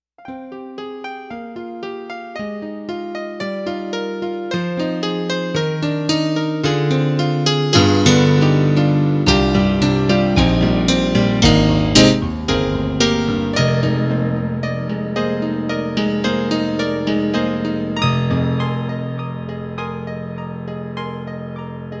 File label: M1 Piano